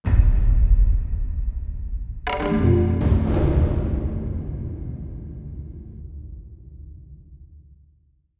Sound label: ambient largeness; eastwest qlso